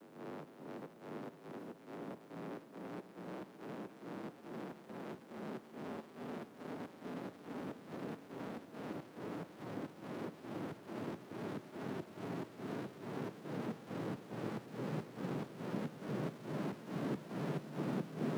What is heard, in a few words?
128BPM Noise 32Bars Sidechain, Clean Noise Ramps; 140BPM; Vengeance Samples; FX Fills